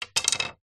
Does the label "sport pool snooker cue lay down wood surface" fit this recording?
Yes